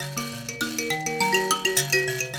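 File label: grand mbira phrase, African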